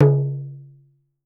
TALKING, African percussion; Voice Crystal - Steve Reid Definitive Percussion

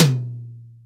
HI PUNCHT, ACSTC DRUMS; Pro-Rec - Synthsonic

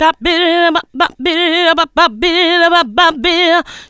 TABYLIBA, Funky Vocals; USB Soundscan vol.18

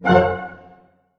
C MAJ PP, ORCHHITS; Sound On Sound - Peter Siedleczek's Orchestra

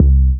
Single Acoustic Basses

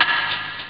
metall hall; Amiga Soundtracker sample

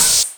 Open HH, Cymbals

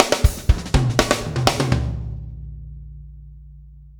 DUGA, Backbeat

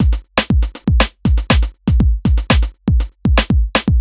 120 beat basic, Nu Jazz House; PowerFX

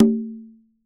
CNG G O G, congas tuned; Q Up Arts; Denny Jaeger Private Collection

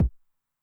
BD Low Tune Med Decay, bass drum, 909; classic machines; 909mod

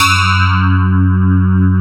sparkles

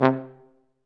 F TUBA, Solo Brass, Tuba stacc

